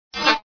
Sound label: scifi